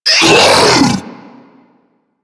Infested Diseased Ancient Pain; Warframe

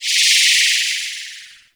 Mushroom Interrupted; Nokko; Warframe; Nokko Colony; Mushroom Hunt

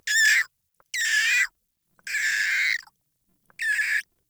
Day Close Critters; Ambience; One Offs; Glades Of Andurin

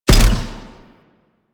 Grenade Launch; Warframe; Vehicles; NechroMech; Abilities

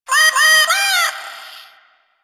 Cat Loot Crate Meow; Warframe

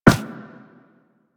Run Metal, Footsteps; Warframe; Operator

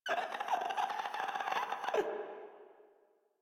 Infested Runner Patrol; Warframe; Runners

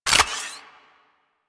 Modular Secondary Handle Fast Reload, Guns; SU Modular Guns; Reloads

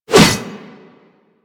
Pole Arm Whoosh; Warframe

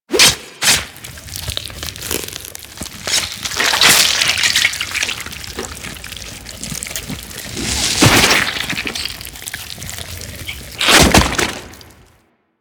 Wall Butchering B; Eidolon Ostron; Warframe; Ambience; Eidolon Plains

